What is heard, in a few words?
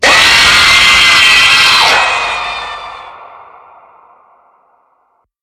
jumpscare; five dark nights in among usster 2